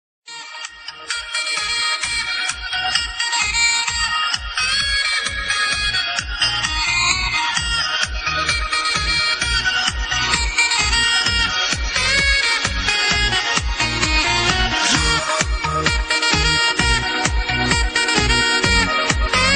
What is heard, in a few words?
Epic sax; Epic Sax Guy